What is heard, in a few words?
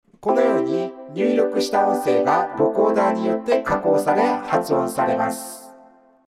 Vocoder Sidechain